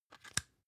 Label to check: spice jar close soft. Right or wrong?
Right